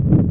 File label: swish lower; The Scorpion King Press Kit